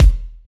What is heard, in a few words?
Kick, Kickdrum; Equipped Music; Loungin House